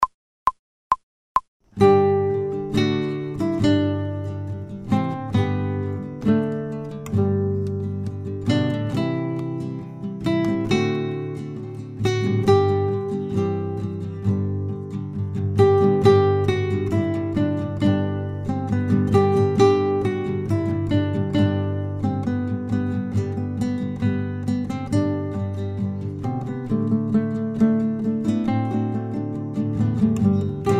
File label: fast, guitar, without metronome